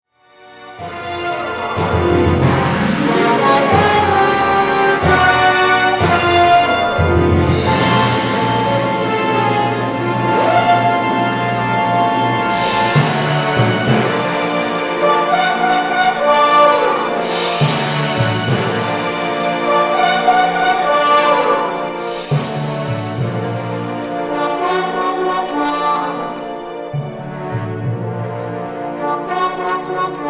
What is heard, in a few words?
CLICK; Gold Finger